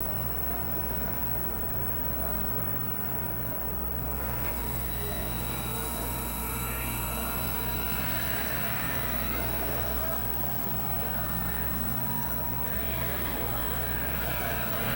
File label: continuous computer hard drive whine; ui